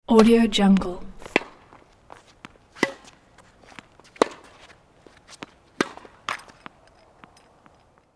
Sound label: outdoor tennis rally